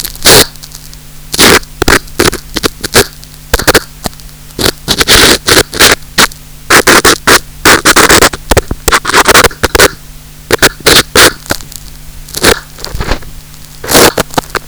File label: scrr